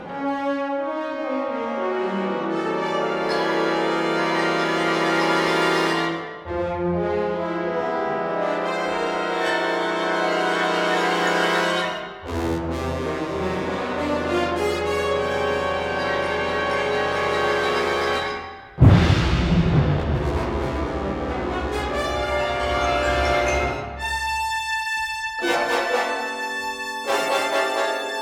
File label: Orchestra